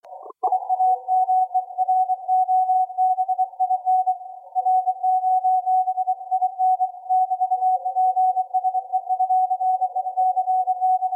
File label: CW processed